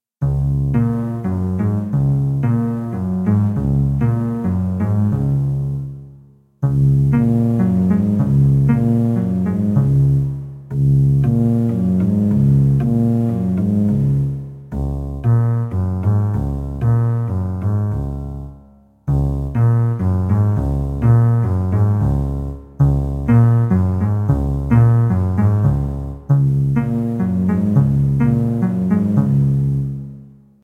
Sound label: Ac Bass, Kawai K1